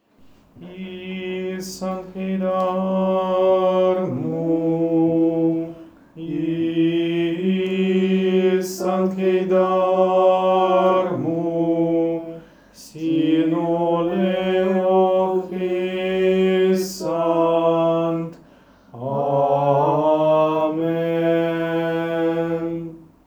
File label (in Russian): Малая ектиния на эстонском